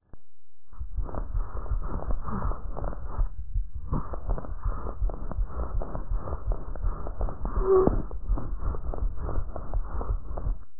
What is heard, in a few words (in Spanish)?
Respiracion soplante